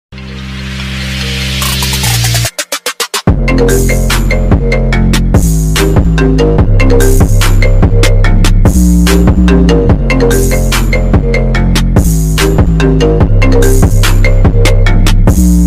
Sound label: iPhone X Remix Ringtone